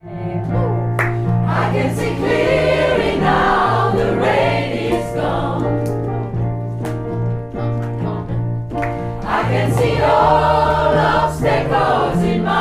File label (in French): tous couplet harmo et fin, gospel; couplet 3 & fin